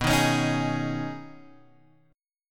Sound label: B 6th Add 9th, down, Guitar, Open G